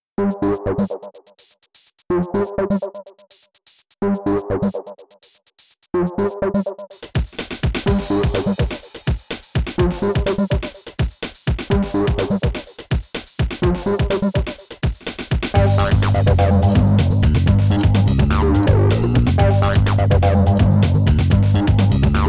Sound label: Jazz with Attitude, MIDI